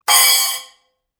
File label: Choke